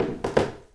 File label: wood creaks